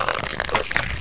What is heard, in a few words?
slop; Death 3 (gibbed); Doom and Doom 2